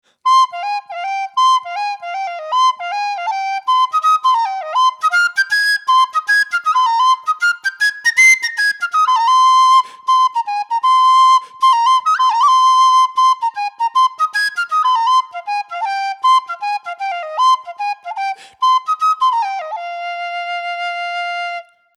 whistle E ash

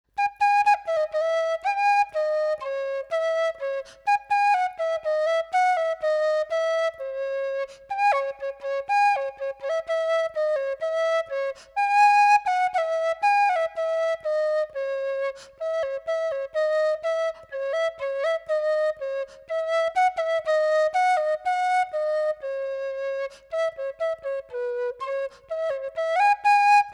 whistle

